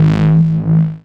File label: G- Bass